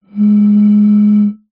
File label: bottle beer empty blow air across top